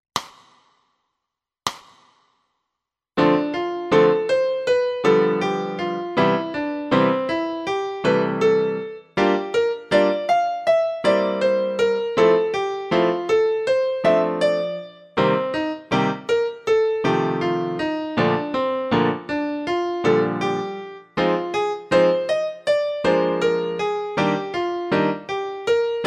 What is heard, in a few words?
piano